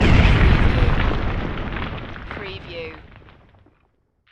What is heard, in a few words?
Explosion with stone rubble; Action, Impacts & Explosions